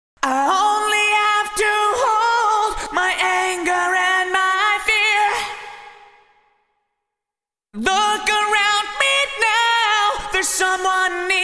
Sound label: Vocals TC Electronics Reverb 4000 Long Vocal 1.7s PD100ms; Vintage Digital